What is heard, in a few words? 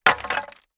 impact metal pole rusty hit loose hard surface